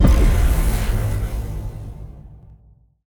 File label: smartbomb; spacemap